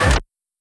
normal hit normal

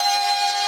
synth main

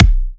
kick

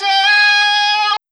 vocal

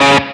staccato; osu

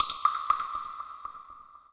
waterdrop

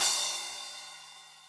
Drum Crush Finish

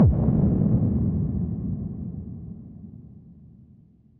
Kick Deep

